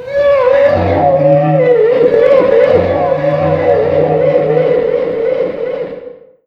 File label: leaper alert; trespasser